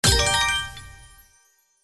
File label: Winning; slots; Aztec Fate